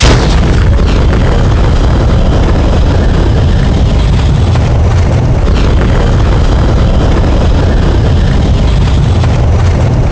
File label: laser fire, weapons